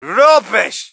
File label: crowd, shout; Mike Tyson Heavyweight Boxing